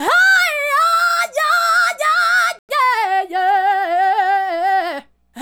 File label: Adlib, Hip-Hop and RnB Vocals